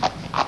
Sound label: HOOVES